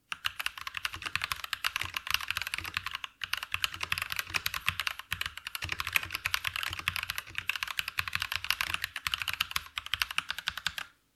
K65 Plus Tippen

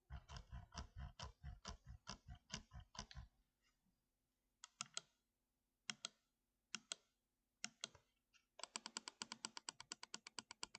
Mausrad Rollen Klicken DPI

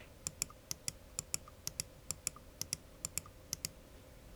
Sound Maustasten

